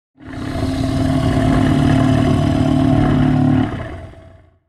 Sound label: Dragon long growl threatening warning